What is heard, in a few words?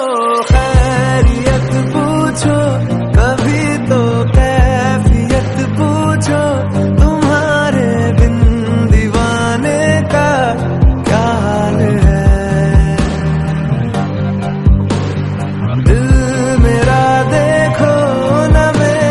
song ringtone; Bollywood